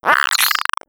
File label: egg short jump; player; Portal 2; eggbot